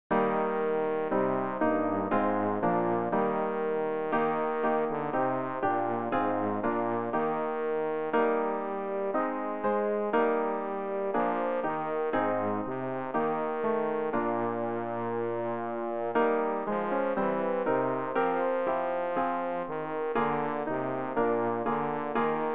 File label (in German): bass; ref-gesangbuch; schoenster herr jesu